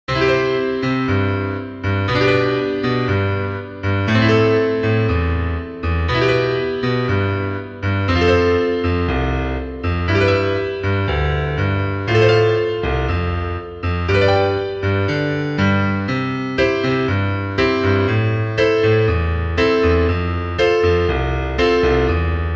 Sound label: piano